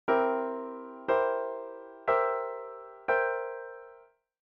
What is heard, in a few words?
inversion progression